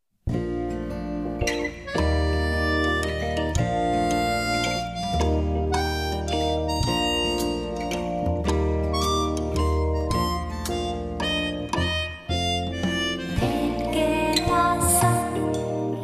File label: waltz